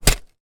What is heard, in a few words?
industrial shovel single dig into soil; zapsplat